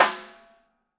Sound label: DW Green Maple Timbali Snare; Sonic Foundry (Sony Creative Software); Vital Drums